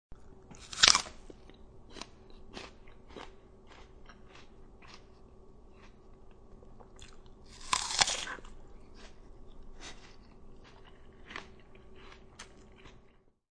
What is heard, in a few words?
Biting and chewing a fresh apple; Eating; Human